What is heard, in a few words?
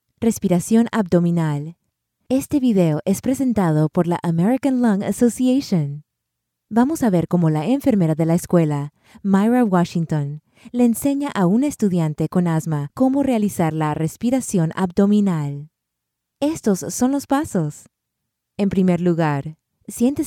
melody spanish belly